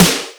IMPACT SNARE; Greytsounds; Scott Peers Sound Engineering

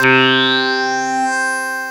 SYN WAVE, Roland, SYN DIGITAL; Sound & Vision - Gigapack I CD 2

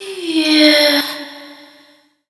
Dance Vocals, FEMALE

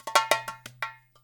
FILL, Percussion; InVision Interactive; GRUNT

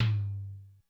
Lo Tom, Korg DSS-1 Drums

